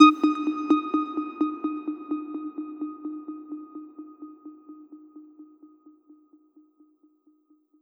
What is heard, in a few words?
FX Misc